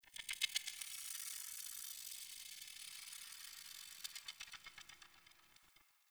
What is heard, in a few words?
Tiny Critter; Warframe; Tau